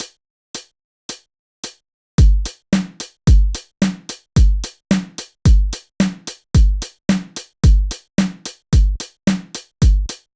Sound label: Beat 110bpm, kick variations for guitar practice drum; kick variations for guitar practice drum foundations vol 1